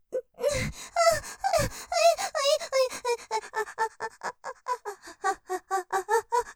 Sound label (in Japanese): 喘ぎ 絶頂寸前、浅い喘ぎでテンポよく; ステレオ